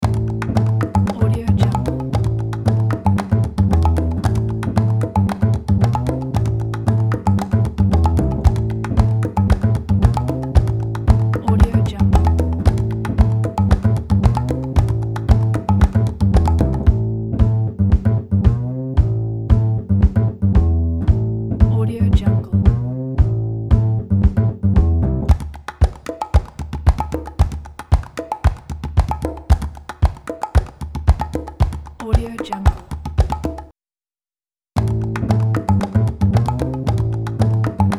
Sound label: fun jazzy background loop